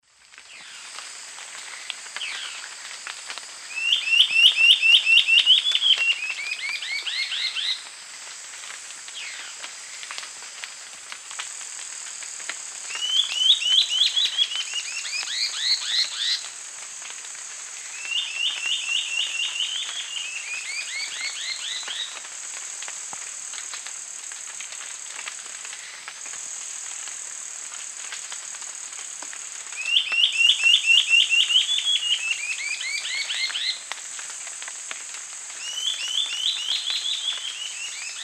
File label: Gymnopithys leucaspis, call and song pair; S. America; Eastern Lowlands